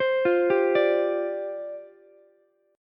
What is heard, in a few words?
C Suspended 2nd Suspended 4th, down, Ukulele; Csus2sus4 arpeggiated; JGuitar